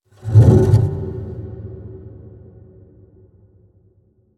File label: Contrabass